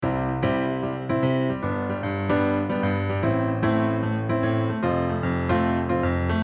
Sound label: shuffle